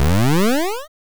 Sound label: jump